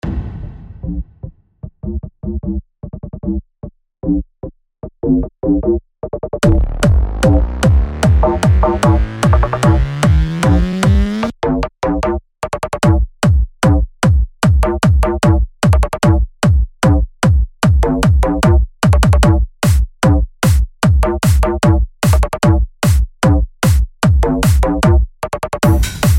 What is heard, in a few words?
hardbass